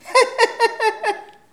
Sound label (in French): rire fou